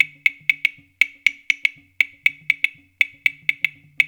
Haitian Congo, World Dance; Spectrasonics - Supreme Beats

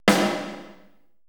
80S DRUM SET

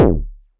Kick, Drum Hits

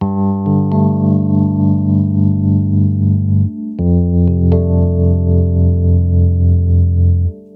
jazz

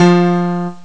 ac piano; Amiga Soundtracker Sample Packs

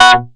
short chor; Masterbits; Vintage Voltage; SEQ-FX

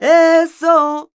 voc a#, Flamenco, Vocals, Female Cantaora; ProSamples vol.46

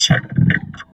VOX, Electro Vox; Sound & Vision; Gigapack II CD 2 (Roland)

